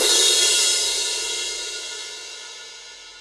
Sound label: Crash